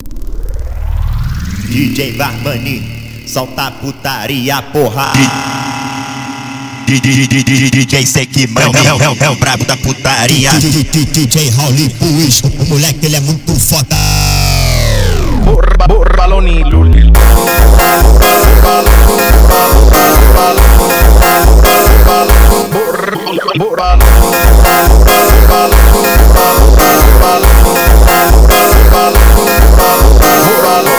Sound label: funk